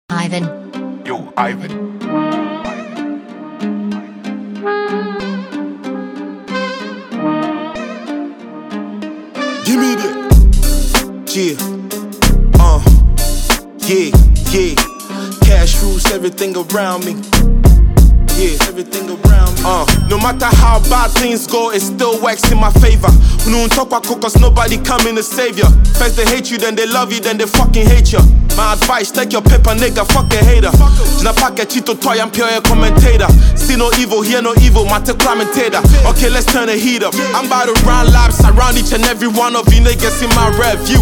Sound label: Freestyle